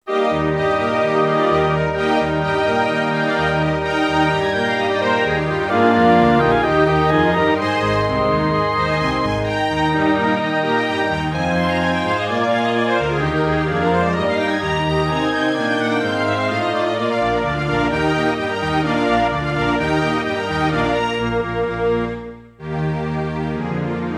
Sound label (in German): hornkonzert orchester; mozart